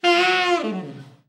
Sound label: TENOB FALL, TENOR SAX; Best Service; Brass Super Section